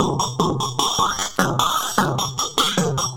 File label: Throatbeat; Sonic Foundry (Sony Creative Software)